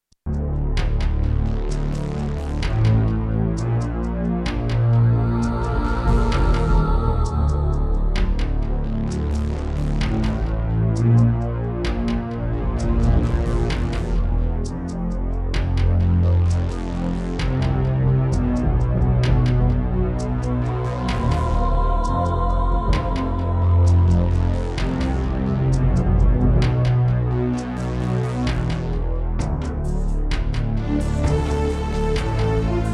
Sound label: Yamaha MU90; Descent II